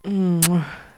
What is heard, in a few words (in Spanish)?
musica bahia